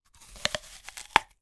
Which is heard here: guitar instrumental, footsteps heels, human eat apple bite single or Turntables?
human eat apple bite single